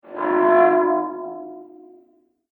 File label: Alien Warning Sound